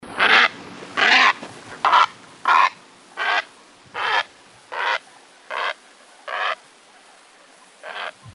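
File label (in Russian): грубый звук старой цапли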